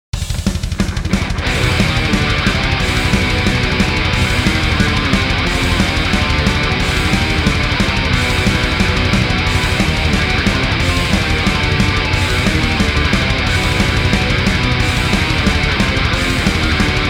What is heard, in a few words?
Music Bosstheme; sturmfront the mutant war ubel edition